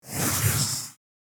Pencil drawing smooth medium stroke

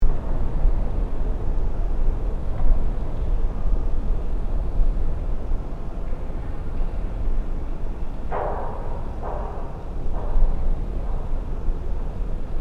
Ancient ruins desert ambience loop; nature

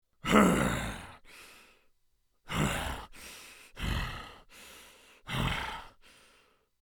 Orc creature grunting breaths

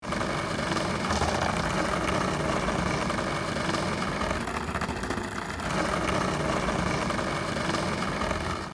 Skateboard rolling

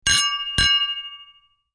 Glass bottles clinking together

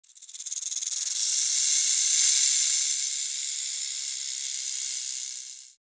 Rattlesnake tail rattle

